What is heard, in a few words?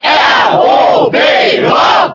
Cheer German; R.O.B.; SSBB